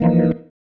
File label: Stab One Shots, Organ